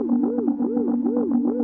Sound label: Woo Woo Beam